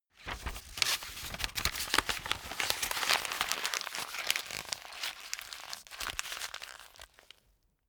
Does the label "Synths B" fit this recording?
No